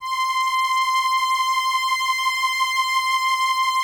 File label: LAMBDA, String Machines